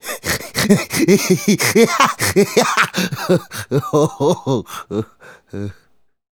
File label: Male Laugh; Laughs; Sonic Foundry (Sony Creative Software); Vocal Toolbox